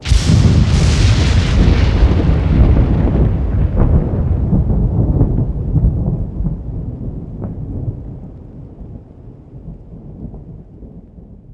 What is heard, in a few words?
Lightning Thunder 1 shot; Journey